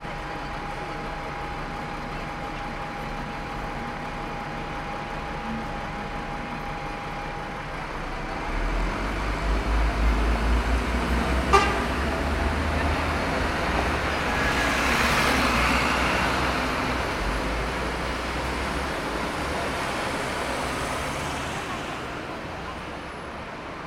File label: ambience urban city quiet night; sound effects one